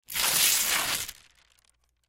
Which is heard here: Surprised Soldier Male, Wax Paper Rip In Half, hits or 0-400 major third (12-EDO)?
Wax Paper Rip In Half